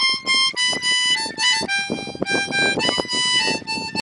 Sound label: Harmonica